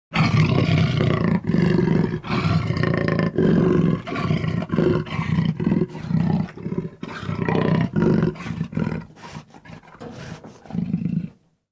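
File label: jaguar roar sound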